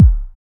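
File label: BASS DRUM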